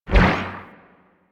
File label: Dash Bubble Start; Powers; Warframe; Focus; Operators